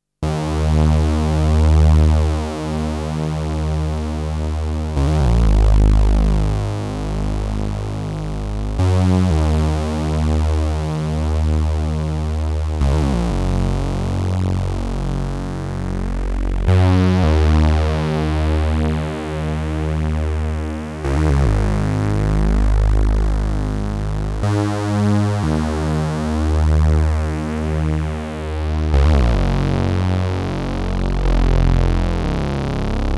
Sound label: two voice pro vcf